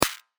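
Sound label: Modified Clap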